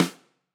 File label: Snr, drum